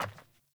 Sandals Walk Metal; Characters; Player

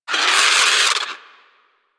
Avichaea Vocal Alerted; Warframe; Hunting Critters

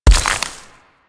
Step Surface Foliage, Mech; Step Surfaces; Nechro

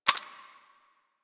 Rifle Mech; Bard

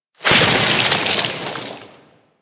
Terra Vent Iced Explosion; Warframe; Corpus; Arachnoid Camper; Death

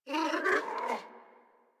Puppy Idle Speak Playful; Enemies; Kubrow; Warframe

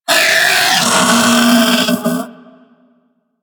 Infested Splitter Split Top Vocal Death; Warframe; Grey Strain

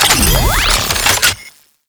Shields Up Battery Delivered; Tau; Twelve Minute War

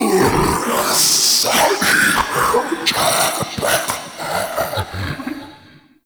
Mockery Male Vocal Stand Long; Characters; Enemy; Mockeries

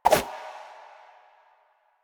Run Whoosh, Footsteps; Enemies; Soldiers; Warframe; Lasrian; Butcher

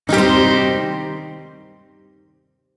Shawzin Pent Min Chord B; DE; Warframe; Duviri Shawzin

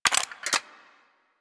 Pistol Reload, weapons; Warframe; Thanotech

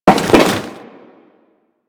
Crewman Land Default, Footsteps; Warframe; Corpus

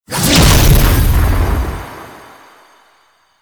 Explosion; Warframe; Quests; The New War; Narmer Outpost; TNW Snt Chamber Archon Intro

